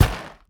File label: Hit; Pinata Smackdown